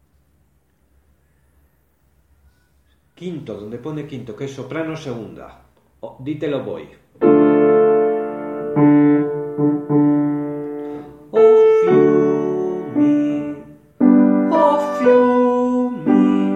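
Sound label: soprano 2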